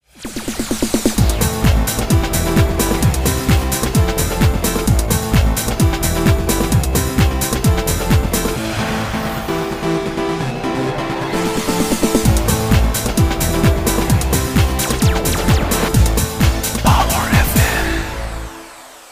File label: jingle long